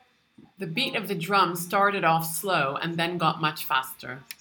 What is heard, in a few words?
beat drum